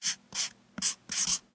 write rub

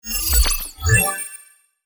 ui match succeed

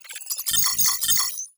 mecha lock three

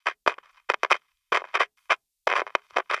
recorder glitch

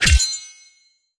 imp brighten sword

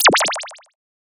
ui good evaluation

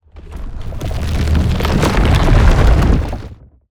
crystal magic grow

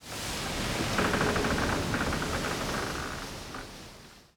ship creak

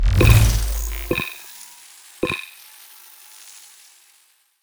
skill mini uav scan

